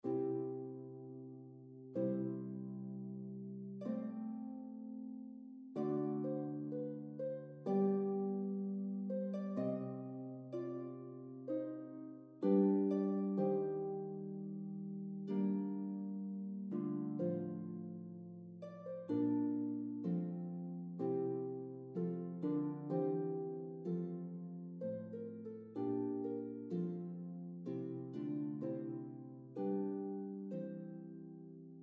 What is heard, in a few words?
harp